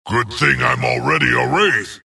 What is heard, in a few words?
death long; dota; skeleton king